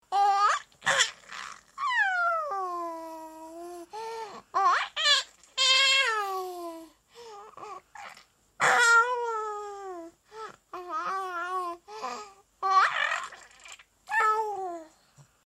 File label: Baby Sounds